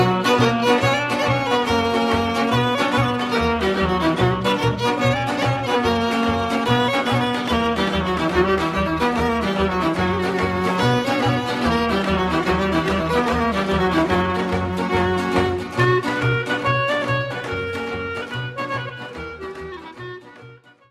Horo; Bulgarie

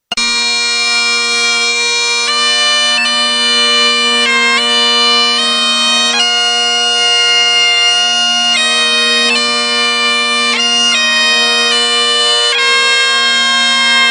biniou; instruments

